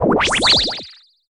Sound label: UI Craft; Inotia 3